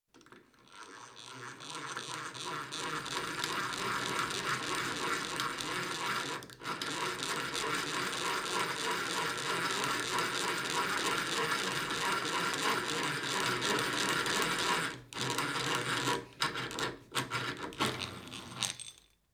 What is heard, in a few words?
Hand-Drill, Single Hole In Metal; Construction; BBC Sound Effects Library